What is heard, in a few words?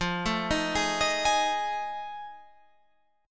F 7th Sharp 9th, Guitar, Open F; F7#9 arpeggiated; 17,16,15,15,18,15